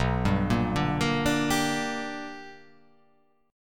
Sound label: B Minor Major 7th Sharp 5th, chord